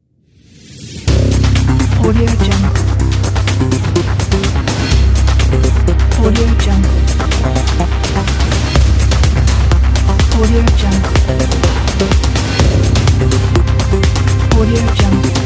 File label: global logo